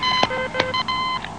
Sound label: Beep